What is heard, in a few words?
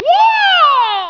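flying kick; mk2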